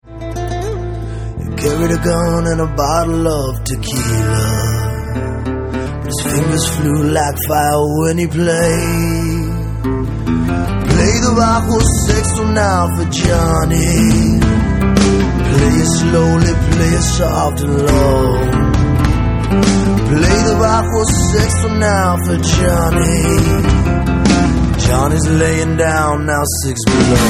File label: bajo sexto